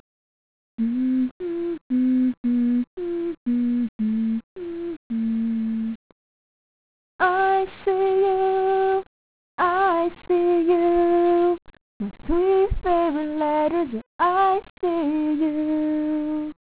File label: karaoke